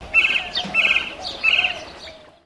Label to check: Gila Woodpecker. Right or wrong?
Right